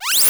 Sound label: open, Interface Sounds; Kenney's Sound Pack